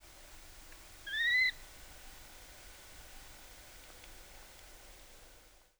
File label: Little Grebe ssp. poggei; RUS; Muraviovka Park